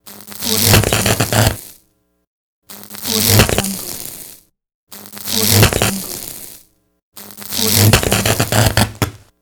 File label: welding